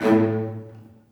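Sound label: celli staccato a2; Sonatina Symphonic Orchestra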